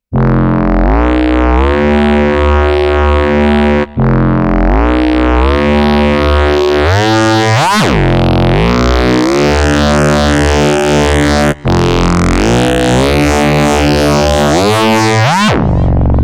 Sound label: KORG Node Molten Lead